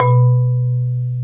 Bass Marimba; Roland LCDP11; Africa VOL-1; Af.Marimbas

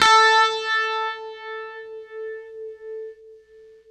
UNIVIB A, Guitar; East West; Steve Stevens Guitar Samples Collection

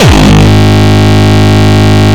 Longkick, kicks

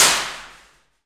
PRC ALMWA, Aluminum Wall; Roland L-CD701; FX Perc 1

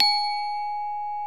glock G#4; E-MU Formula 4000 Series Vol. 5; Protozoa; Proteus2 Presets

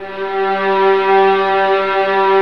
VIOLINS, Strings; Synclavier; ILIO

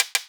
CLP WOOD eq, EMU Orbit-3 drum machine; 300 Drum Machines

